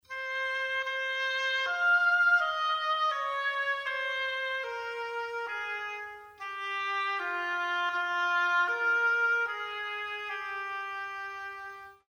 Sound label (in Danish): Obo; Træblæsere